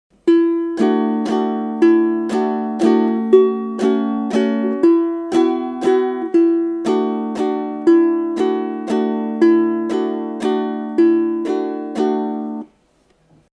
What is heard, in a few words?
Strum, ukulele